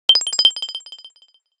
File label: Alert Modern High; HTC Touch Diamond